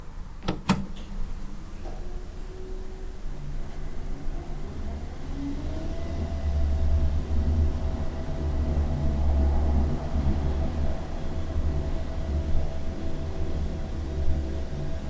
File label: elevator up